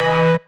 Synth Shot E

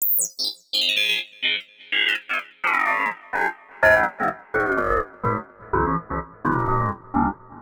126 F MUSIC; funky; Loopmasters; Funky Underground Vol 2

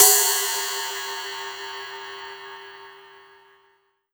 Ride, Cymbals; Mutekki Media; Vengeance Electroshock Vol.2

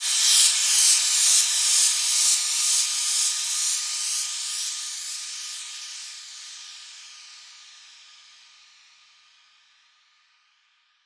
FX Misc

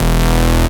Bass Shot E

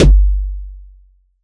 KICK, DRUM HITS

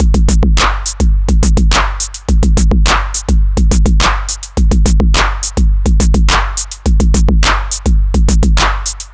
105, Digital Breaks; Loopmasters; Jon Carter & Alex Blanco; Block Party Essentials; No Im The Man

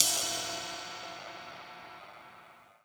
Ride, Dubstep One-Shot Drums

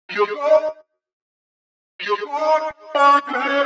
126 VOCAL LOOP, Funky Underground; Loopmasters; Funky Underground Vol 2

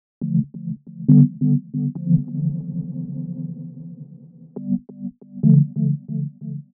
Bpm138 Synth Loop Dm; Prime Loops; Dubstep Illusions; Airy Loops

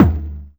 Toms

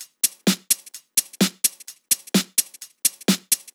Loop, 128 BPM

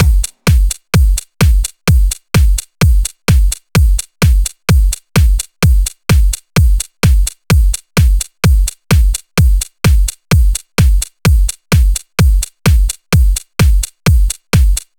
Electro House Beat; Mutekki Media; Vengeance Electroshock Vol.2; Electro House Kit 3

